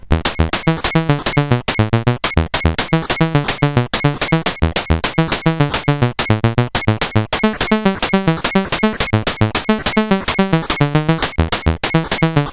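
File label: Moon Patrol, MOS 8580 SID chip music, games; CSG8580R5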